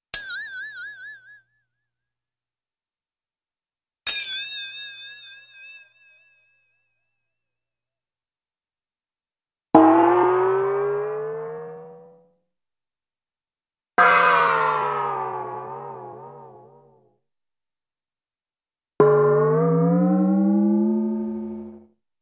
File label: Bell-Gong Hits; Cartoon; Hollywood Edge; Cartoon Trax